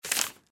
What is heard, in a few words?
card flip